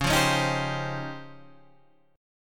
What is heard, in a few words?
Db Major Sharp 11th, Guitar, Drop D; strummed; chord shape; 11,8,11,8,8,8; JGuitar